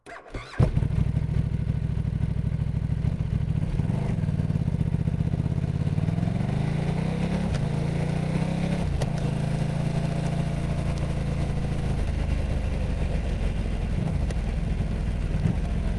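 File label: onboard startup away driving gearing stop shutdown rear wheel, KTM RC8R motorcycle; mkh8020; Sonniss GDC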